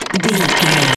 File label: gun auto barrel spin slow start